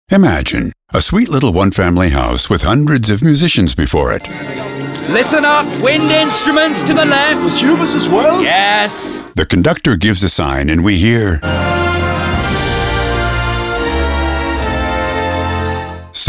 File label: Orchestra Radio